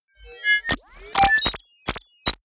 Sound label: confirm glitch, signale; soundpool